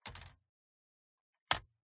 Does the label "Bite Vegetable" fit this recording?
No